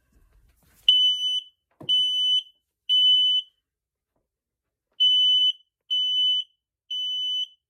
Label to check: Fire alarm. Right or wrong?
Right